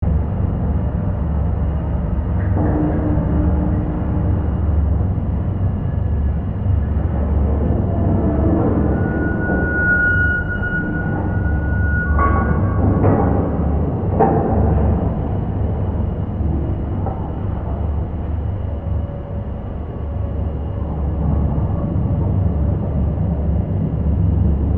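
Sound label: Ambience General Med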